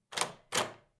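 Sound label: door handle sound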